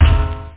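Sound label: bass drum; OctaMED SoundStudio V1; jazzies samples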